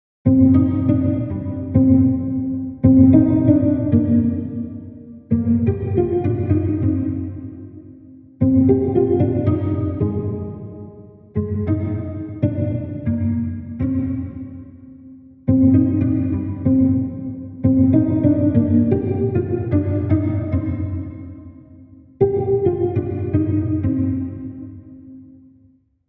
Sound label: CELLO E